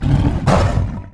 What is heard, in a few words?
attack, fire tiger, monster; metin2